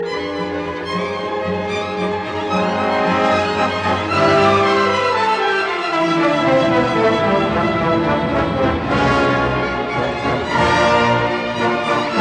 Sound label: Symphony in D minor, Scherzo Ziemlich schnell; Symphony No 3; WAB version ed T Raettig